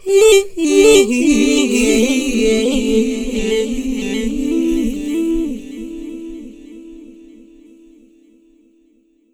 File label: MACHINE L, AUTOTUNED, Funky Vocals; USB Soundscan vol.18; AKAI